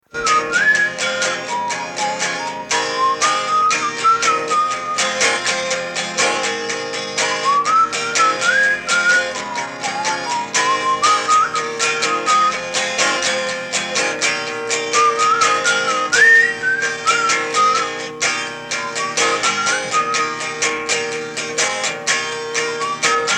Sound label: Whistling idea